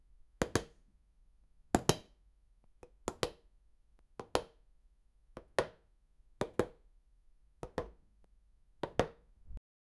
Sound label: punch sound